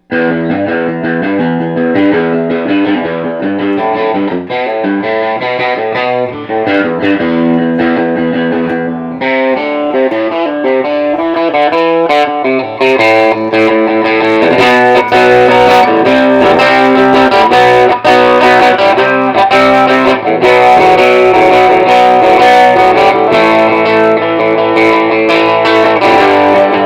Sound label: Fender Amp